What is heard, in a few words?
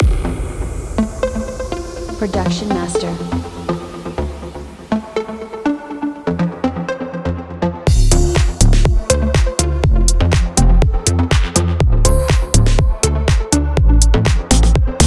Deep House; Production Master